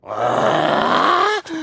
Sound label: Growling; Hifumi